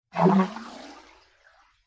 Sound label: Underwater Spear Bys, whoosh